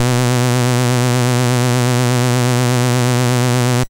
SID Bass B, chip; CHIPSHOP HITS

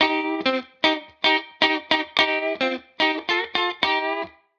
Guitar Line, 110bpm, hiphop; musicradar

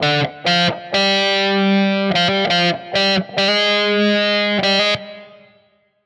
Guitar Line, hiphop; 85bpm; musicradar

